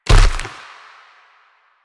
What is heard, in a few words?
Proto Infested Runner Step Default; Warframe